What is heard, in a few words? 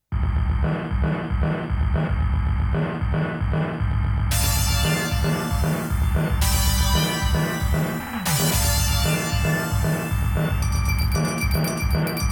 inorganic beat